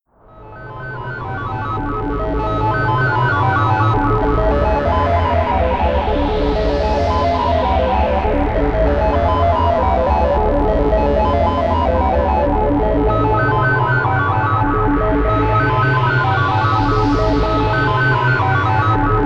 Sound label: crumar DS2, Blocky Waves; square wave LFO